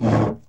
BOX SLIDE, Box Noises; E-MU Producer Series Vol. 3; Hollywood Sound Effects

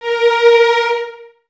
Violins Detache A#4; Miroslav Vitous; String Ensembles

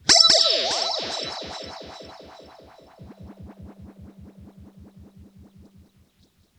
RIPPLES L, Funk Guitar; 90 s Sample CDs; Zero G

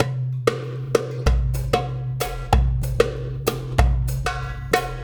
Tactile Impulse, Processed Drumkits; Sonic Foundry; Zero Gravity Beats

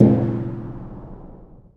Af. Hand Drm, Ambient

